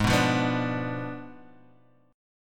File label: Gsharp Diminished 7th Gsharp, Guitar